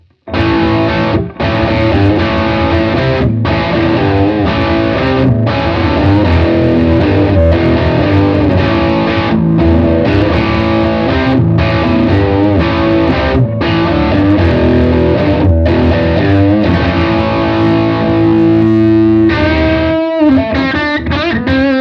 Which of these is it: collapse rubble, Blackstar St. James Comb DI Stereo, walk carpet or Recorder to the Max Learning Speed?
Blackstar St. James Comb DI Stereo